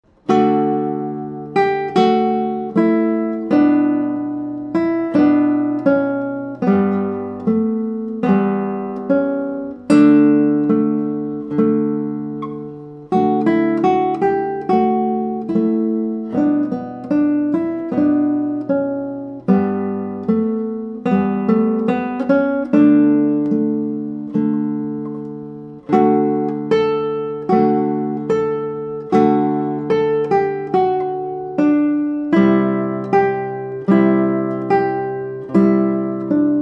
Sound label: Jig, guitar